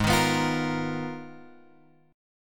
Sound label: G sharp Minor 6th Add 9th; G#m6add9 Chord {4 6 X 4 6 6} strummed